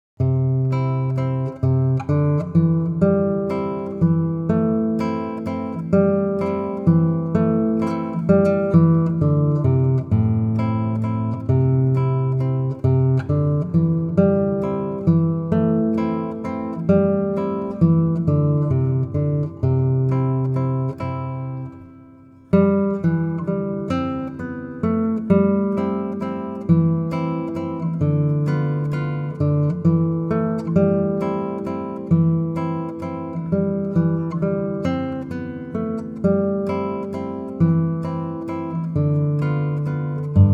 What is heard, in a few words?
waltz, guitar